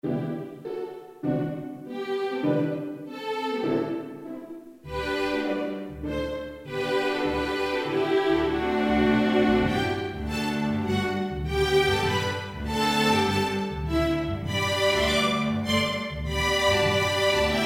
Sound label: string orchester